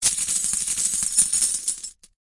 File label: money